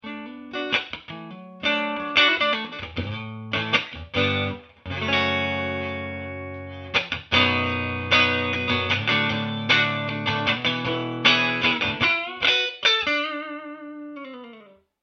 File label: clean